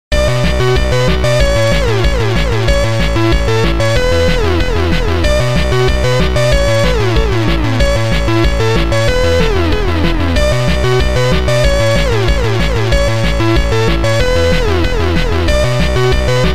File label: KEYGEN; RELOADED; NFS